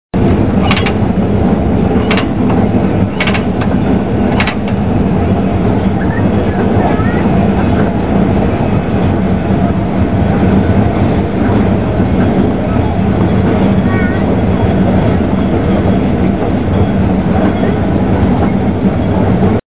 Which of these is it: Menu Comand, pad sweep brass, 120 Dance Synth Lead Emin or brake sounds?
brake sounds